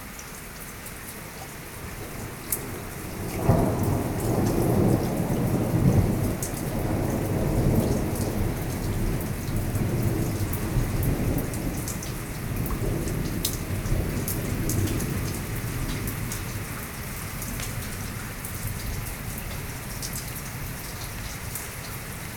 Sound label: outdoor